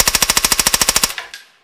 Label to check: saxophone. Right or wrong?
Wrong